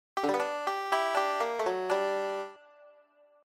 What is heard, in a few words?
tag lick, banjo